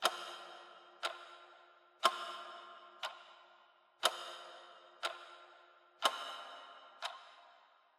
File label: Clock Second Hand (Reverb Loop)